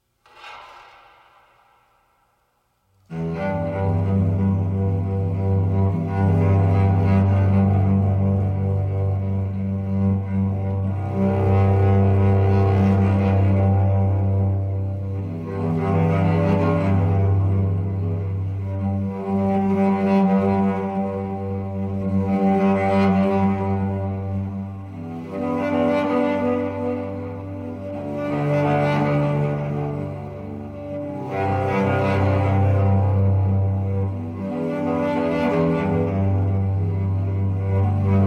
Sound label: Improvisation